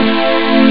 saw min; jazz jackrabbit 2; M8

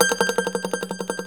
MANDOLIN, Tremol Mandolin; StTremolMandolin

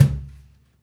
Cajun, Percussion One Shots; Essential Percussion

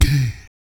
VOC PERC, Vocal Planet Jazz FX BASS PERC

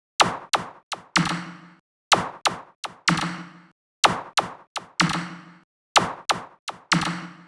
Fx Loop 125; Equipped Music; Loungin House; Perc Loops 125

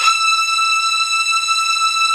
SPARCO VIOLIN

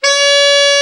Alto Sax; Sound & Vision - Gigapack II CD 1

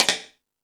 Tool Kit, Drum Machines

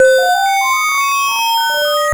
ETHNO BELL; SONIC ELEMNT; SONIC EL YES

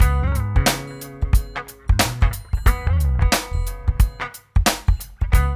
mix, funky loops; East West; Funky Ass Loops

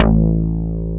DIGI BASS; DIGI BS

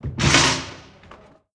Toolkit; Zero-G; Total Drum Bass; Hits and Bits

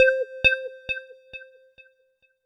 C3, SynthLine; 90 s Sample CDs; NiceBeats; SynthLine VOL-10

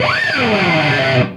MT SCRAPE, HEAVY METAL; Greytsounds

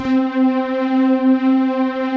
M MUTE PADS; Pro-Rec - Synthsonic

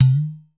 udu, percussion

